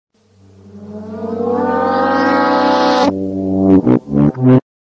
horn, reverse

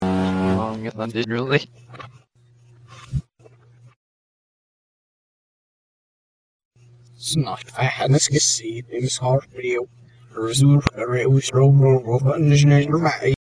engine weight, reverse

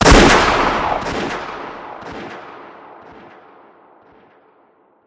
gun

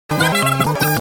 wood

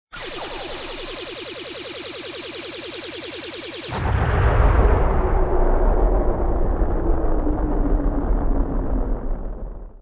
bomb

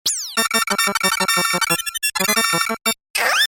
course cleared

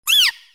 toad joy

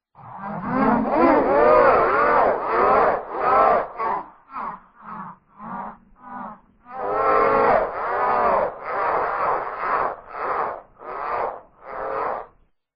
laugh

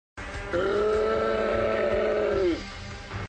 OOOOOH

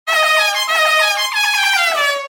horn

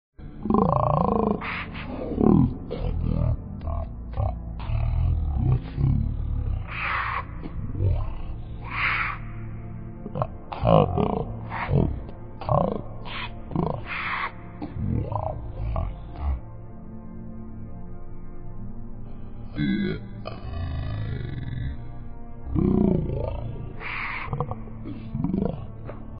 screams

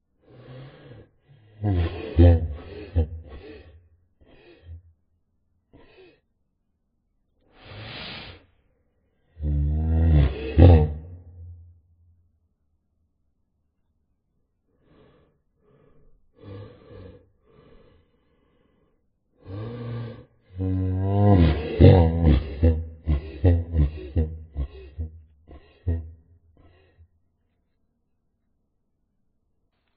cry

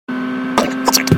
headshot

